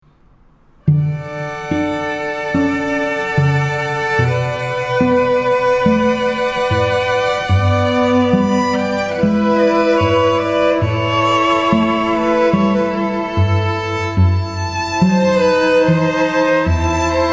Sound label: String Quartet; London